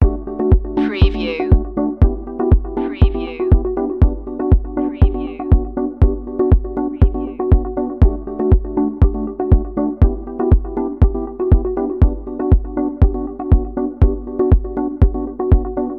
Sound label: communication beacon loop